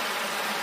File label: fire extinguisher fire continous